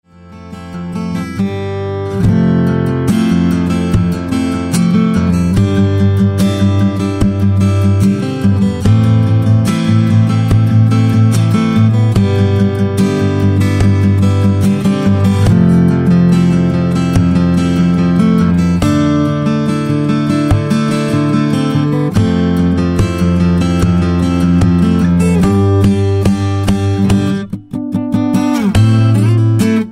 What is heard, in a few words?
theme guitar; pubg